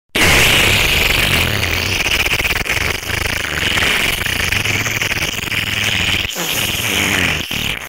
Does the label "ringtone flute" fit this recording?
No